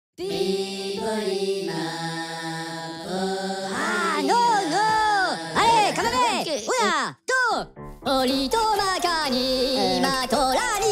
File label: Minions Jingle Bells; toque